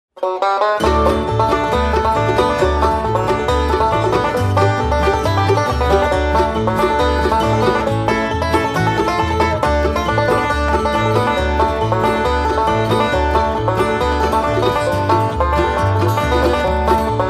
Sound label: Blues, Bluegrass